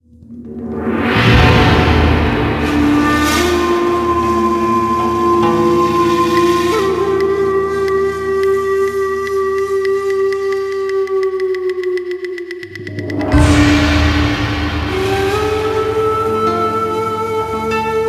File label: BGM, game over; Real-Time Conflict Shogun Empires